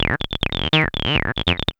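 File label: synth sequence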